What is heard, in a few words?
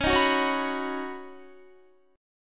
Db 7th Suspended 2nd Suspended 4th Db Guitar; Db7sus2sus4; strummed; tuning=4F,4A,5C,5G,5C,6E; 20,18,18,18,18,19